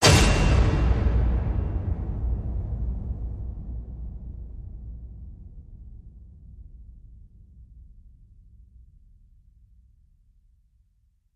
Hit Big Exploding Flare

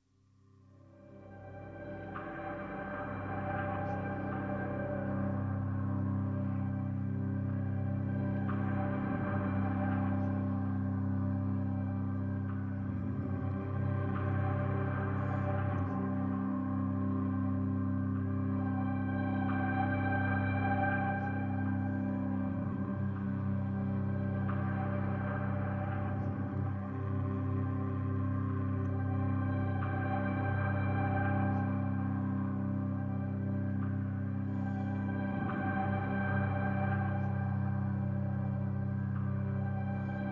Main Drone

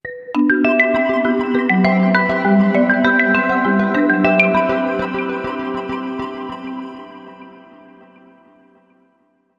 Bells

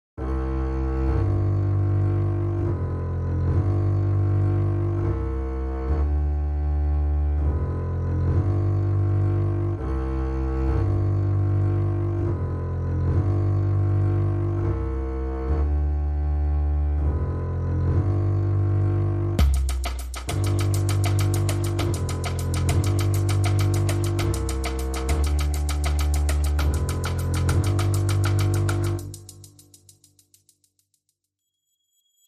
Drums and Basses

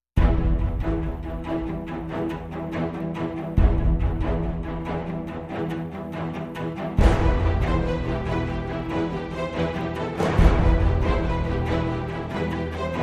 No Perc

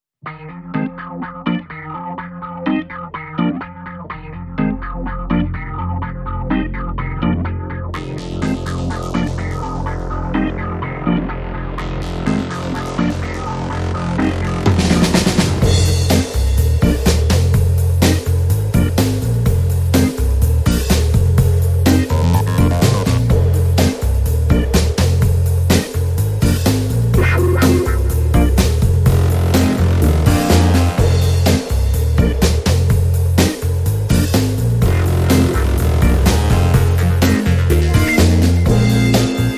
No Brass

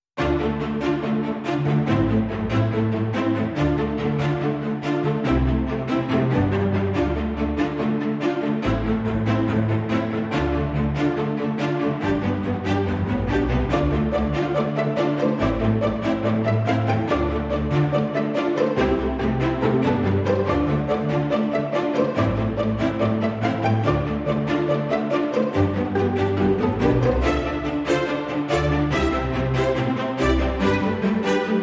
Strings Mix

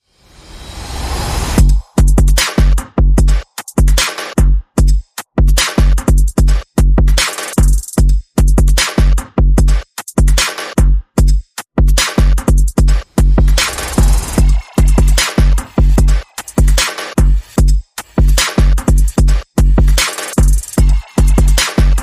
Perc and FX Only

